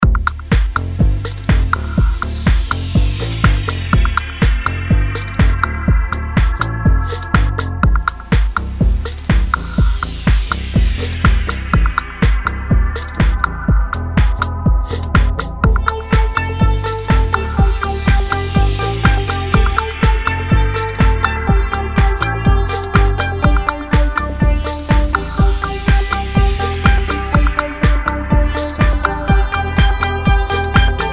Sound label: Naughty Beats